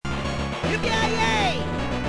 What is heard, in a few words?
level clear; spin master